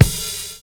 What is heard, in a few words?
BRUSHING; Univers Sons